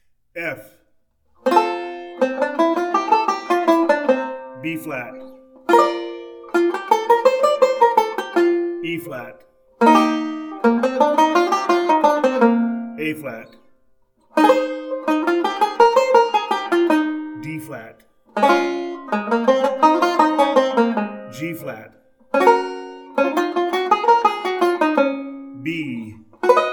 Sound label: 2nd Circle Drill, banjo; Beyond Chord Melody Volume II